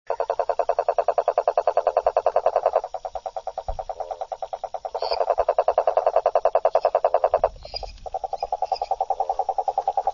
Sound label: Rhinella schneideri